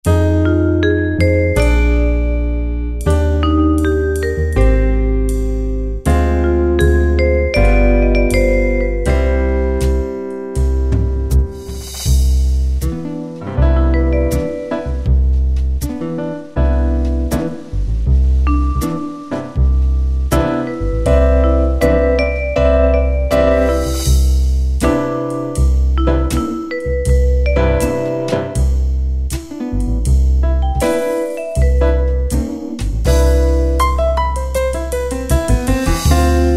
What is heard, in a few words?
Jazz Ballad, Yamaha QY70; Preset Styles